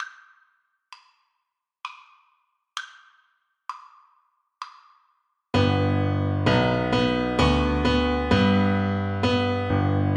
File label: minuet from don giovanni viola